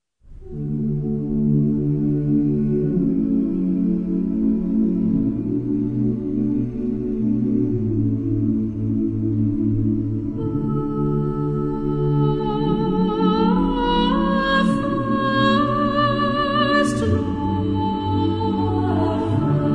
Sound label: piano, and 12 Ensemble